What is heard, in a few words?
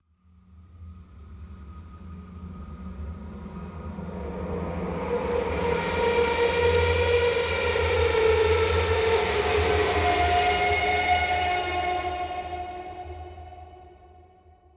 ambient; pzserver